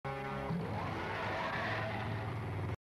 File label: Roar